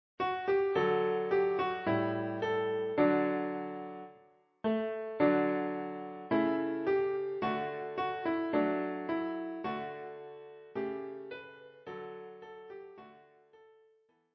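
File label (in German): Ade zur guten Nacht, piano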